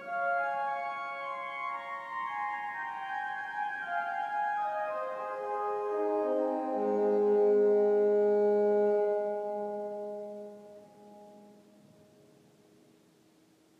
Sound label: vox humana organ in martinikerk groningen